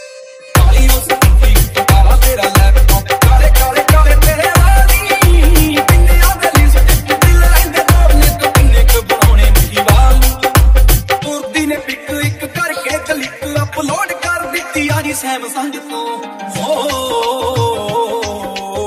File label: dhol remix dj ringtone